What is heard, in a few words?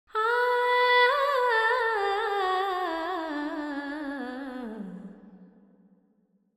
Verb 4-4 G 100, Vocals Female